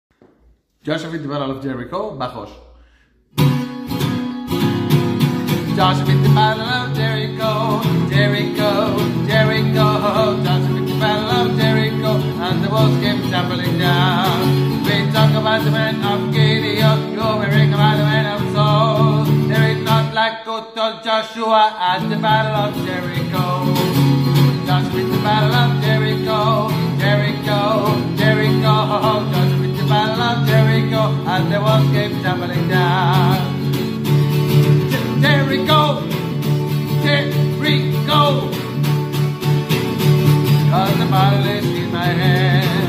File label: josua fit the battle of jerico altos